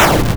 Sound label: helicopter attack; tanks of freedom; units